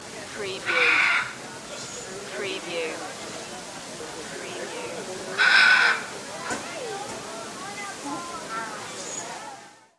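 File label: Parrot Park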